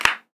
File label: Foley